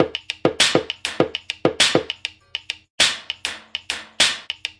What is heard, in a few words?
lofi clicken poppen, drum loops and breaks, 100 bpm; 091 to 100 bpm